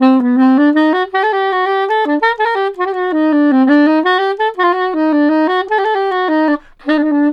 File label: SAX, 132, Balkanish Traditions; USB Soundscan vol.63; AKAI